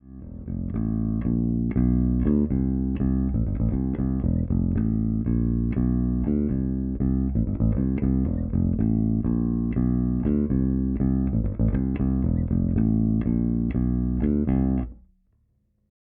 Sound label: Monoton; Zoom B2 Four